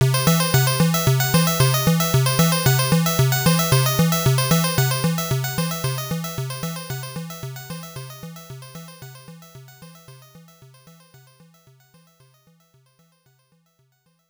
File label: Hurry Up Music; Toy Pop; Sharp X1 Turbo